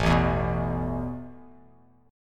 A Suspended 2nd A Bass Standard; Asus2 strummed

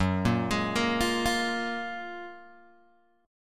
F sharp Major 9th F sharp, Guitar Open G

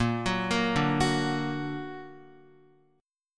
Bb Augmented Bb, Guitar; arpeggiated; JGuitar

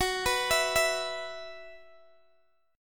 Gb 7th Suspended 4th Gb, Guitar Open G

